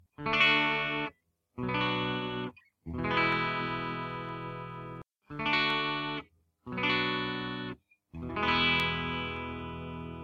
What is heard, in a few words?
chords clean bridge